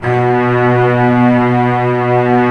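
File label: cello B1; Digital Sound Factory Virtuoso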